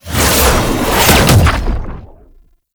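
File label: Teleport Attack; Enemies; Abilities; Warframe; Tau; Dax Ninja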